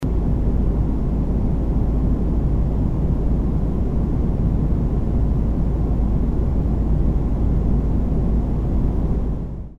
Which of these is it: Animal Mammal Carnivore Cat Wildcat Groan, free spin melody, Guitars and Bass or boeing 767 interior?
boeing 767 interior